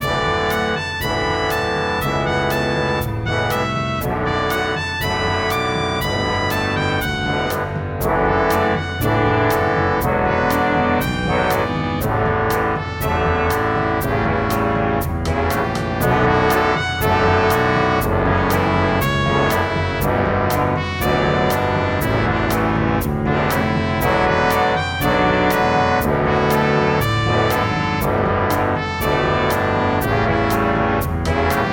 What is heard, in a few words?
sunlit station fanfare